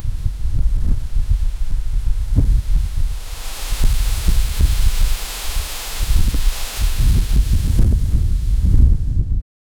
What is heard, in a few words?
wind through trees, forest